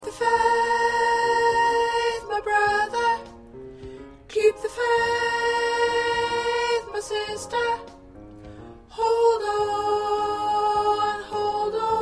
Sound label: Alto